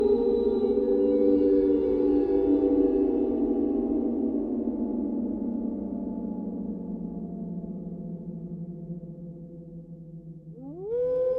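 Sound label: ambience owls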